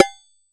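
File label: COWB ELEC, electronic cowbell; Crypton - DrumBase5000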